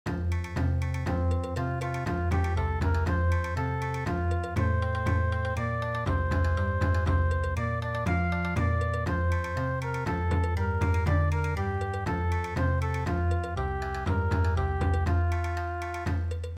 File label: Bon Odori